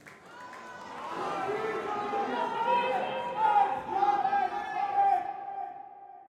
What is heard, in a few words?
defeat crowd; hades; smite